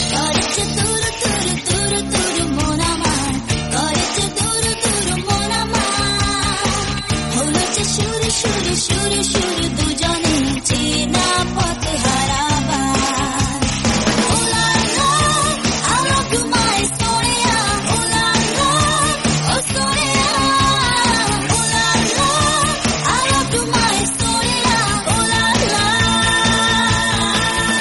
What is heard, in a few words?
bengali new ringtone